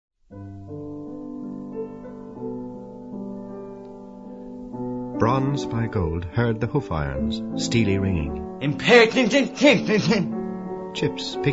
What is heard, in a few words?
sirens